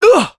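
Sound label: pain sharp, French; Spy; Douleur aiguë 3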